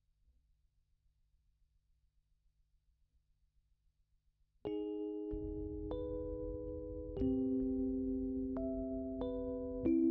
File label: Rhodes